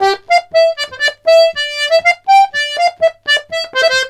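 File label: Accordions, polka; USB Soundscan vol.40; AKAI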